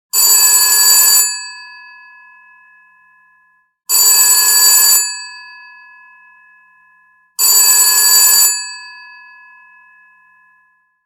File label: loud classic phone ringtone